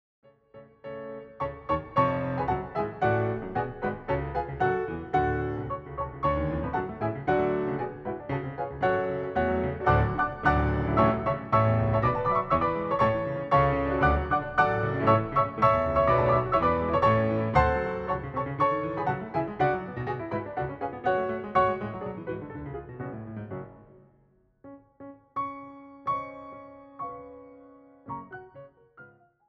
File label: Hungarian Dance in F-Sharp Minor- Andantino – Vivace; brahms; 21 hungarian dances; WoO 1